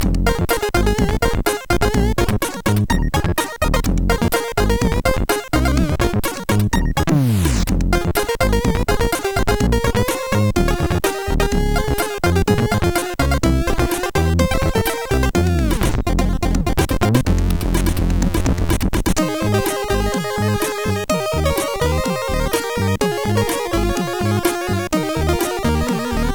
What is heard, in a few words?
Why Beep None sid MOS6581R4; hvsc